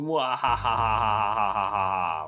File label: wahahaha; Taco